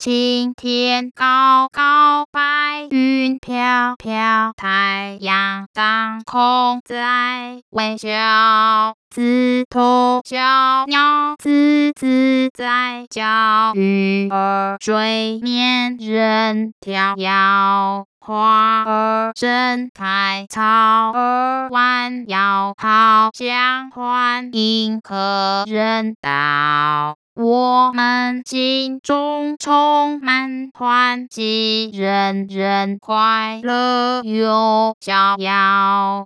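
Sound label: Happy song, Mandarin Singing Voice Synthesis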